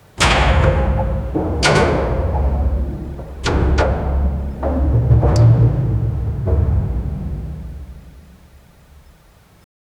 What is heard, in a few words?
architecture